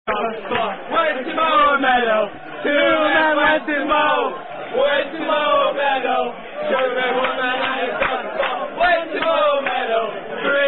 Football Supporters; 10 Men Went To Mow

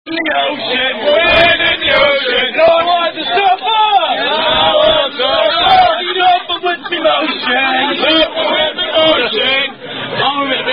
Rugby Party; Lockport Rugby Party; Hey Bob-A-Re-Bob (Roll Your Leg Over (Variant)

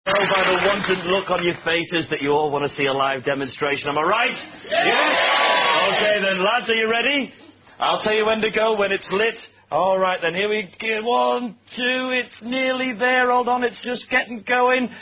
drinking game; Flaming Assholes Drinking Game

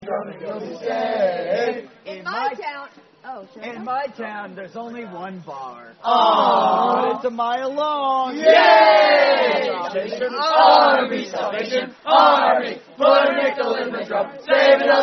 Scout Camp Salvation Army Song; Related To Nickel On The Drum And Boo Ya

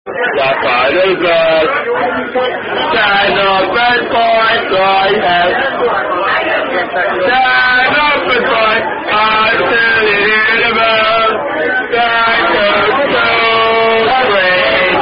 Unknown Rugby Fan Song; The Wedding Singer

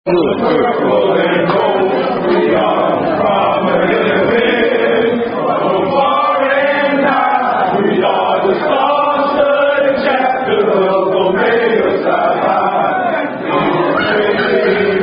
Unknown Omega Psi Phi Fraternity Song; Beta Phi Line 2010 Part Ii

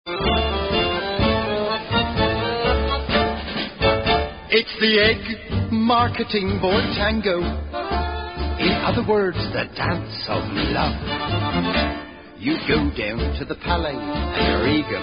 Tango

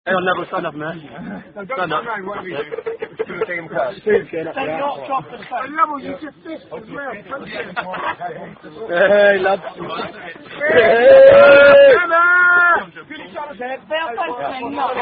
Spoons

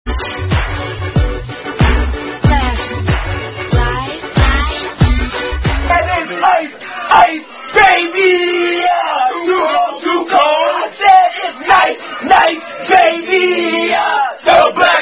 Alpha Phi Alpha Fraternity Chant; Alpha Phi Alpha Chants

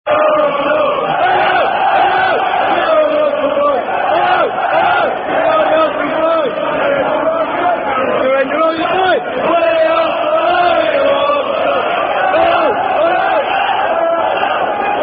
fans half time singing; Arsenal Fans Vrs Chelsea

